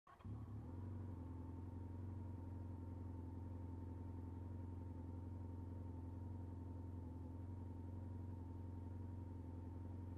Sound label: Motorcycle approaching, turning off